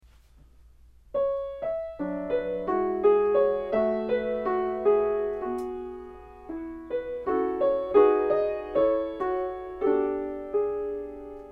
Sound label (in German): Kleine Klavierstuecke, piano